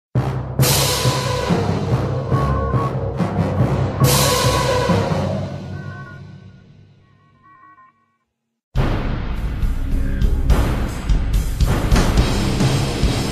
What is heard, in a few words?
Fighting Monsters; Cartoon Network Universe FusionFall